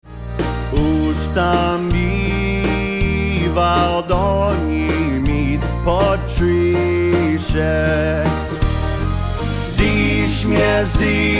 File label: Singing Mockingbird Waltz